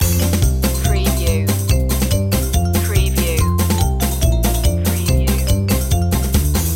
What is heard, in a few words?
kids party loop, Harp, strings and woodwind instruments